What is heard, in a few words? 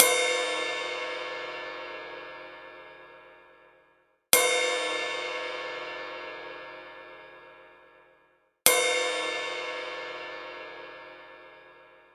Ride Cymbal